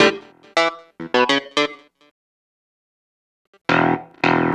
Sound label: Electric Piano, Loops, Keys